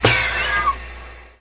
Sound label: factory air release, factory percussion; UNUSUAL KITS and FX